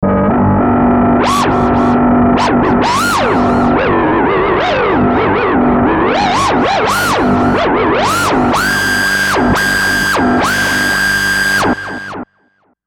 Feedback Low Static Delay Squeals, Feedback Distortion Left To Right; Feedback Sound Effects